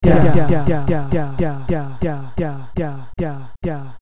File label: reverse bouncing; nyquist